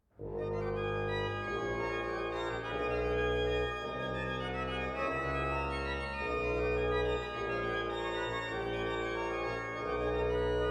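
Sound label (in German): Sei gegruesset, Orgue; BWV 768; Freiberg; J.S. Bach – BWV 768 – Partita en sol mineur: Sei Gegrüsset, Jesu Gutig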